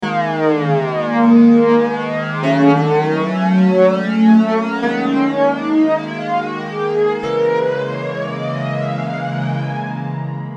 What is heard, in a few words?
m1000 boss bf1